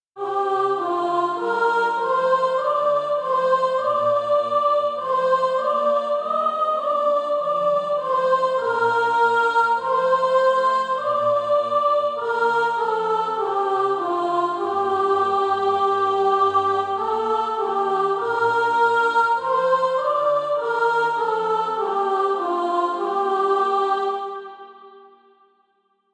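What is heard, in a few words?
soprano, Practise Music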